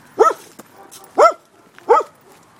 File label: Barking of a dog